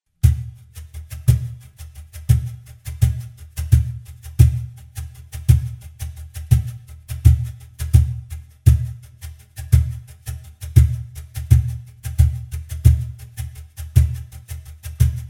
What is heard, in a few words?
cajon